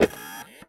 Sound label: Single Shunt, DVD Drive External